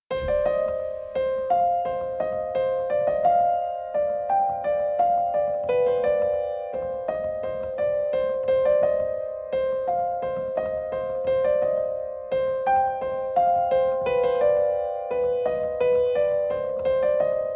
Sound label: piano